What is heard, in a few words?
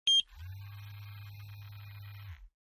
pager alarm, beeps